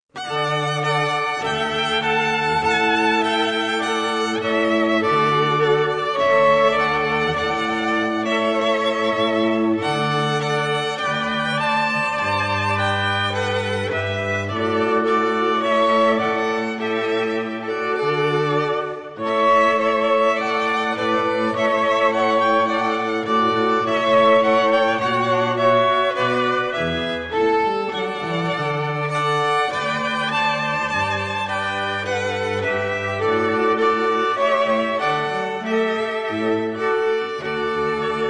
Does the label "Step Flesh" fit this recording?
No